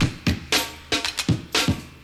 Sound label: BREAK